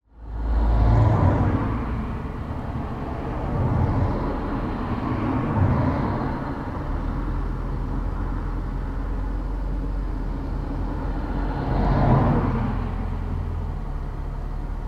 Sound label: ambience traffic pass by parked car internal recording